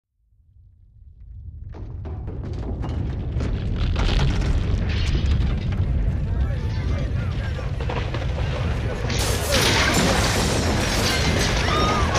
zvuki razrushenij pri zemletrjasenii

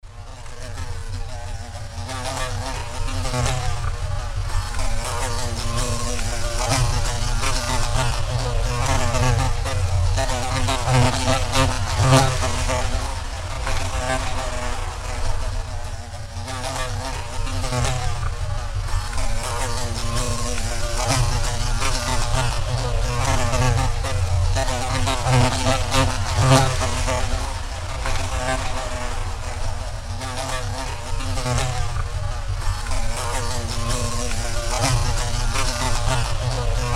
zvuk letajuschej pchely

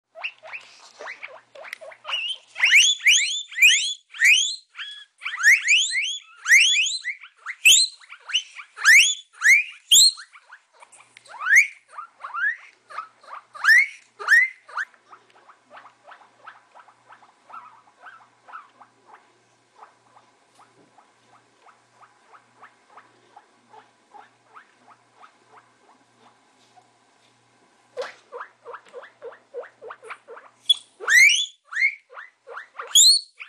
zvuki morskoj svinki

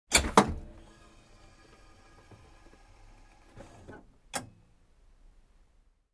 dver avtobusa otkryvaetsja

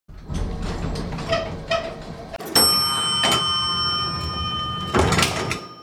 zvuk rabotajuschego lifta

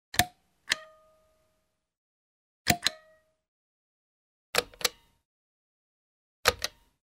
nazhatie na knopku

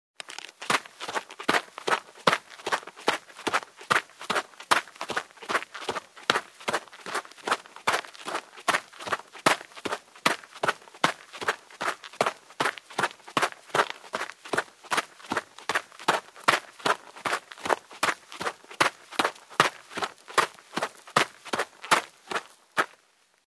beg po snegu

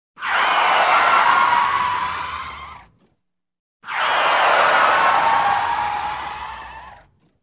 vizg avtomobilnyh koles

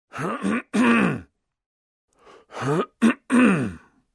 pershenie v gorle u muzhchiny

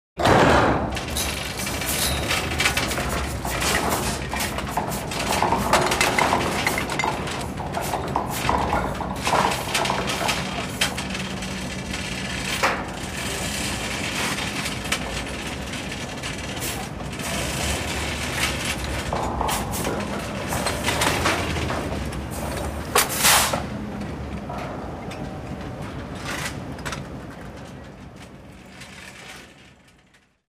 obschij shum raboty na fabrike

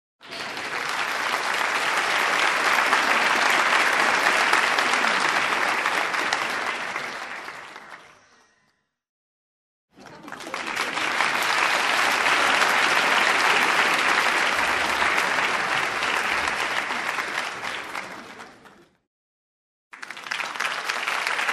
zvuk aplodismentov i ovacii

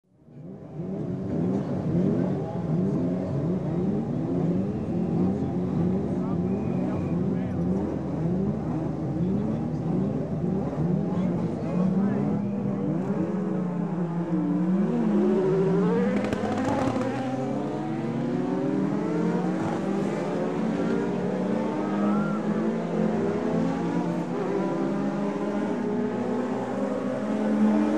proezd bolidov pered startom

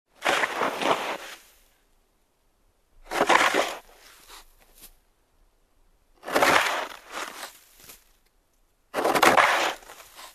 zvuk razgrebanija snega

